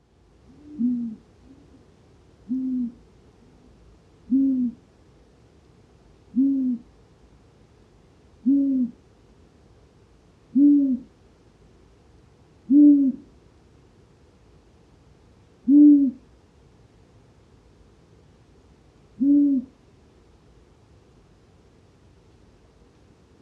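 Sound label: long-eared owl asio otus, night birds; ushastaya sova; nochnyh ptits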